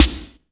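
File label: EFEX snare drum, FX DRUM; AKAI S6000 CD-ROM - Volume 1; DRUM RYTHM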